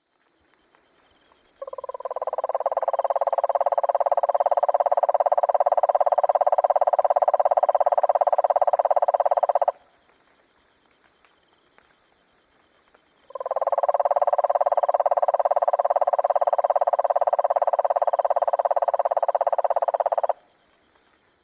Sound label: Northern Mockingbird, Mimus polyglottos; Aves